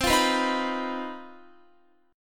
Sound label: C Minor Major 7th Double Flat 5th chord, Guitar; CmM7bb5; strummed